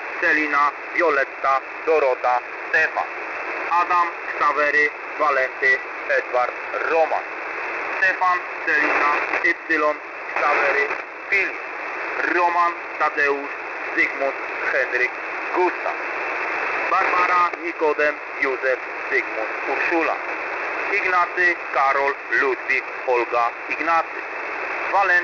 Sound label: usb; Mode: / USB / Frequency: / 5095 khz